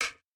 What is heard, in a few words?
breakbeat